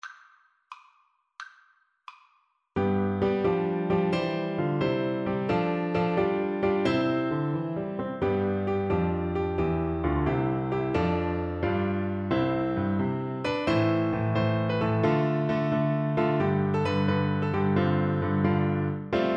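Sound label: recorder karaoke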